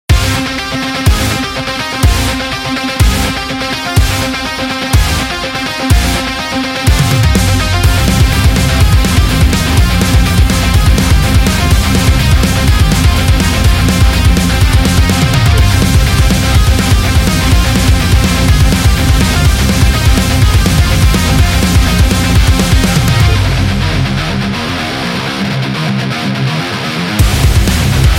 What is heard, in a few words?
Metallic